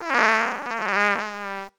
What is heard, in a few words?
fart medium